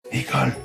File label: wood, zombie; minecraft; mob